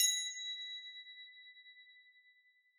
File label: First Scale; Tintin Reporter: Cigars of the Pharaoh